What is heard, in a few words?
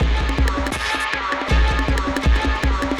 Breakbeat, Drums & Percussion